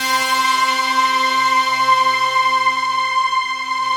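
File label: rough stack, Rough Harpsichord; Optical Media International; Sonic Images Library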